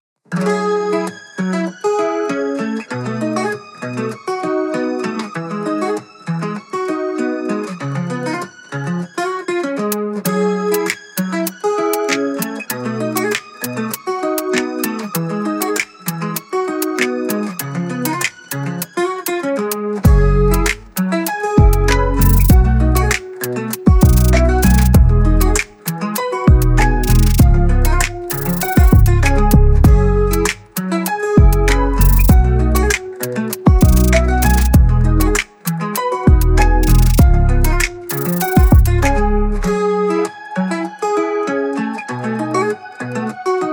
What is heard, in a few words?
trap beat